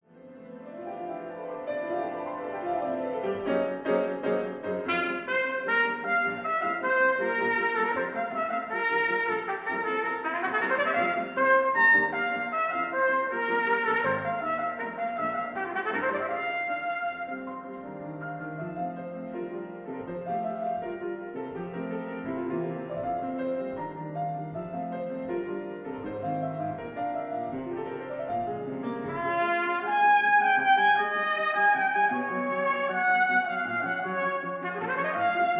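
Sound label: Trumpet Concerto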